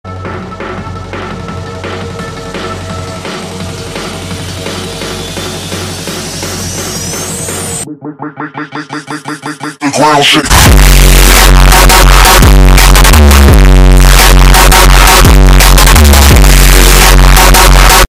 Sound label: Bass Drop